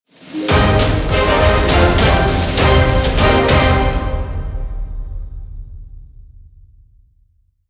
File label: Arena unlocked